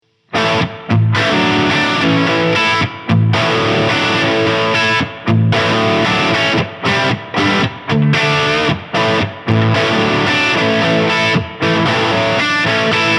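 Orange Rockerverb 50H MKIII Les Paul Crunch Reverb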